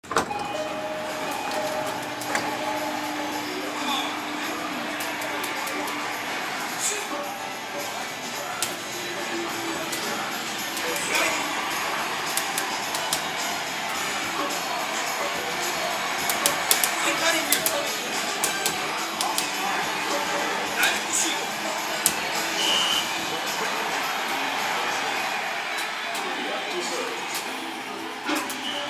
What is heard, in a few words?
Video arcade